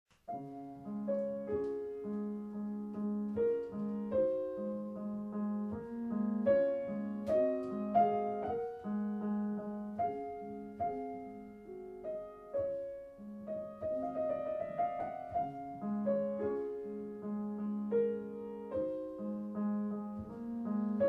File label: raindrop prelude